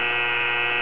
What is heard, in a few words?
gear